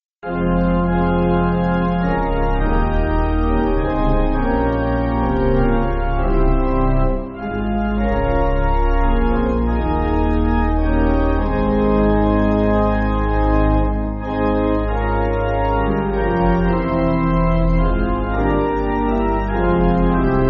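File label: Pipe Organ, Backing Files for Churches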